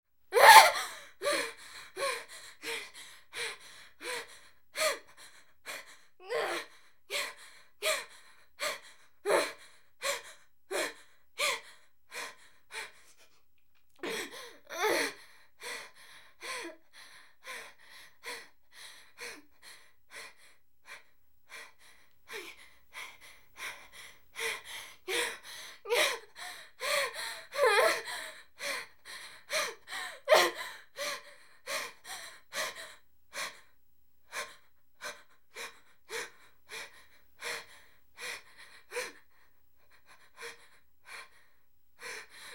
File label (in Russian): bespokojnoe dihanie zhenshini vskriki